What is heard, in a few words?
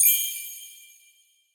Change Finish